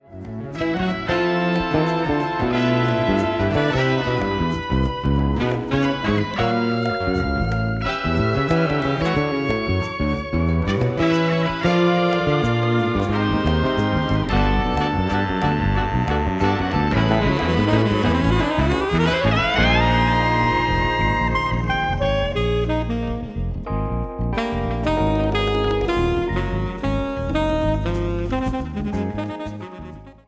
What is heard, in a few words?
Cocotte Drum